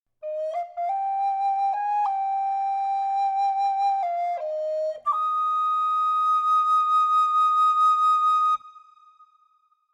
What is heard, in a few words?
duet pizza recorder head 100bpm EbMajor